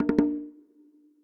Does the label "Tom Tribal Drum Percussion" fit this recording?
Yes